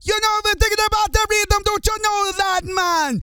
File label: REGGAE, Vocal Planet, World Voices